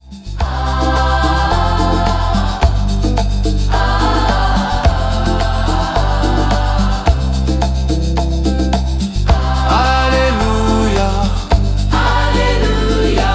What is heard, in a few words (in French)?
Acclamation